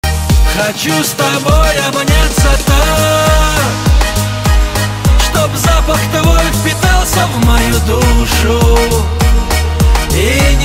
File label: lezginka remix